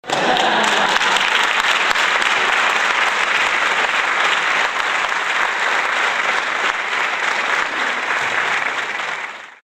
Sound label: applause